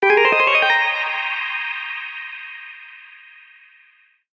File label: climb up, notifications; Sony Xperia Z1 Compact